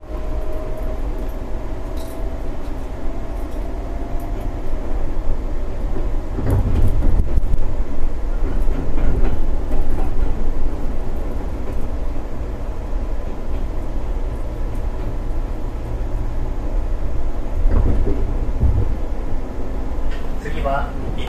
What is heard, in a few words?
on train